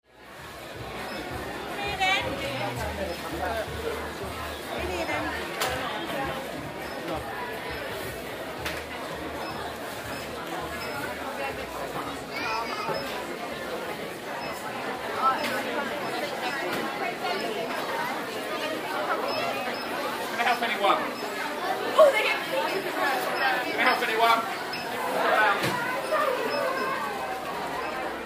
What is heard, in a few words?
poundland